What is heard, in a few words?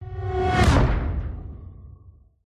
whoosh; Audio Mangler